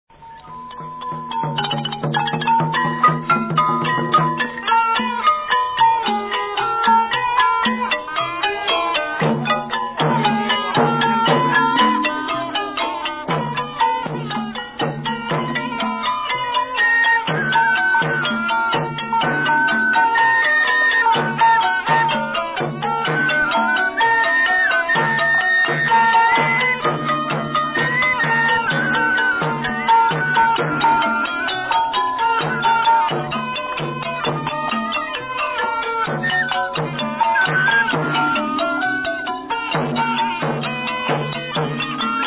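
Instrumental Music, Ceremonial Music at Wat Phou Lanat ek, Khongvong, Pikeo, Khui lip, lanat thum, kong that, kong taphong, 2 khong nyai; Pheng Kom; Wat Phou, Champasak/2000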